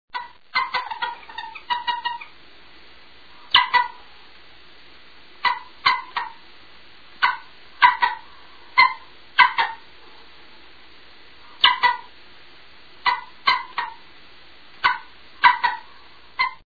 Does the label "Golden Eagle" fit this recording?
Yes